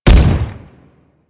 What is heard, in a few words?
Step Default; Warframe; Grineer Ghouls; Ghoul Devourer; Footsteps